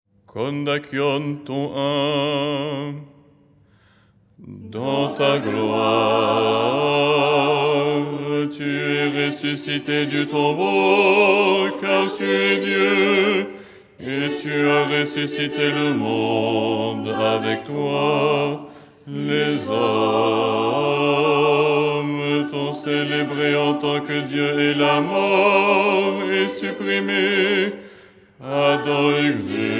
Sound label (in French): basse